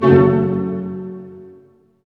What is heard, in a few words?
HIT, Tutti Hits; String Master Series; Dynamic Orch